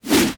blade swing

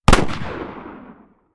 magnum exterior shot

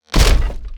shield block; medieval

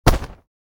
sand bag drop

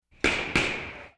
distant gunshots

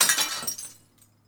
glass shards

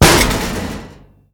metal plate hit, thin metal plate hit

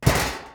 metal tray drop, metal tray dropped

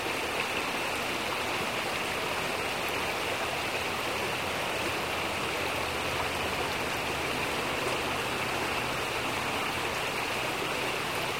river stream; creek

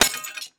mirror smash, mirror break

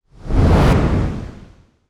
fire swoosh

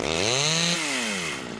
Chainsaw, chainsaw revving

